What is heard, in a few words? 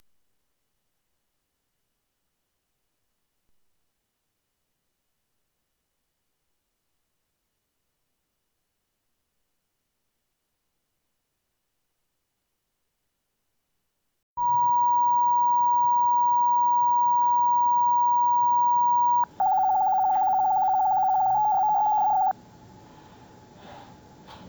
silence fax